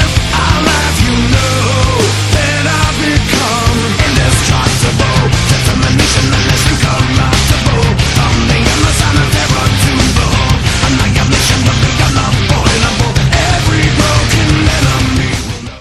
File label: metal; roundsound